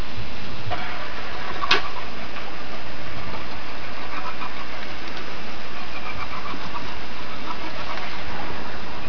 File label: Weird Click, Sea Sounds